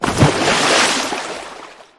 Water; the great ottomans strategy battle for throne android; SFX World Water

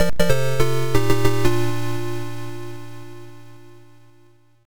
Clear, msx2 psg; Feedback

